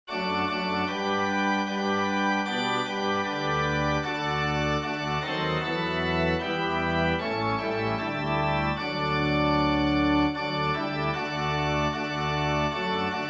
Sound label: children of the heavenly father organ